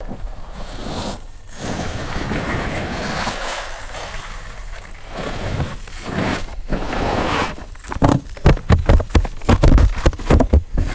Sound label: kayak sliding past on crunchy snow